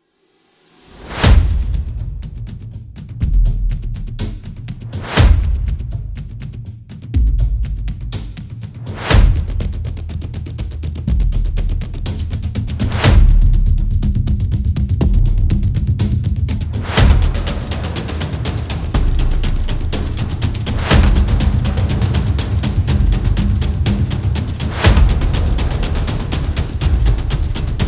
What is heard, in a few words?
Cinematic Drum Epic; monster attack